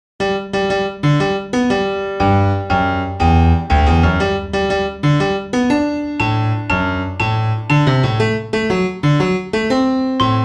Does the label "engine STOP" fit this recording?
No